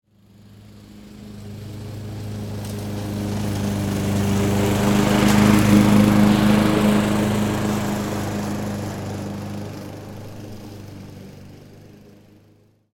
Lawn Mower Pass By, Industry, Lawn, Mower, Pass, By, Left, To, Right, Single; Blast Wave